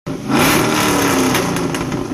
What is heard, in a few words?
Exhaust